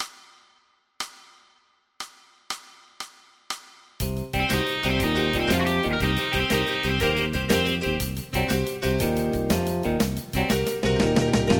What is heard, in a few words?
Uncle Blues